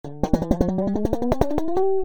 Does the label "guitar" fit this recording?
Yes